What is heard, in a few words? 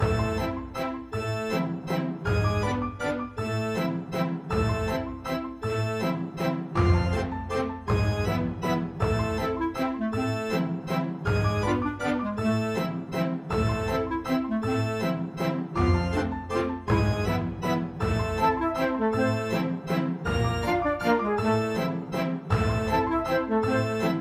Footsteps On The Hell Floor; edge of eternity